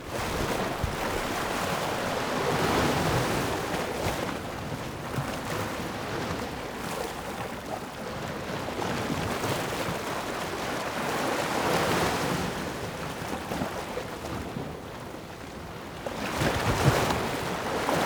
Seashore, Waves

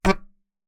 Melodica Short Note

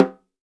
Snare Drum; Percussion Samples

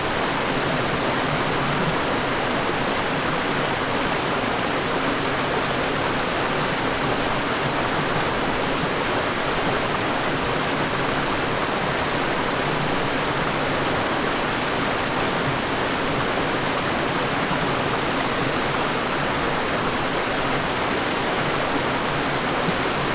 River, Static Microphone

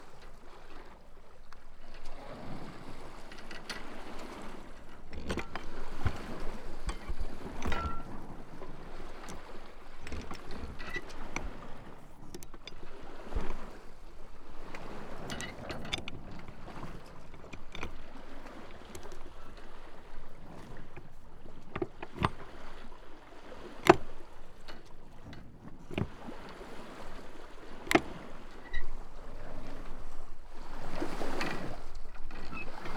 Sailing

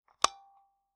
Taxi Meter

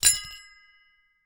Hole Saw Rattle, Hole Saw Bits

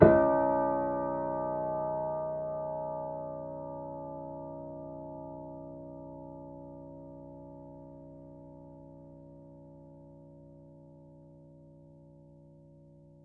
Piano Harmonics, Harm Low Mid

